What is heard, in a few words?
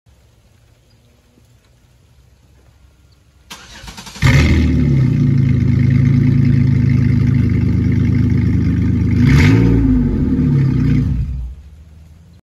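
Pontiac, exhaust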